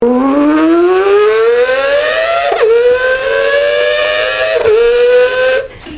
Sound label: car sound